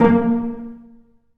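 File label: Cello Pizzicato A#1; Multisamples; Digital Sound Factory Virtuoso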